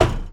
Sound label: footstep metal low run; ProSoundCollection v1 3 MONO